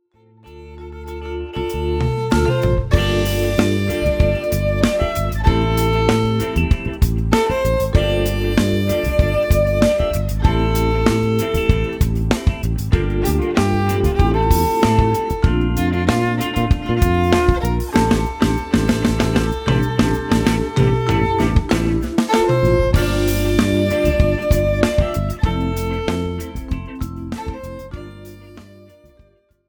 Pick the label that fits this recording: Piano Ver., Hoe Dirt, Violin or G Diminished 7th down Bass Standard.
Violin